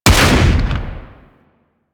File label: Sniper Rifle Shot; Gorg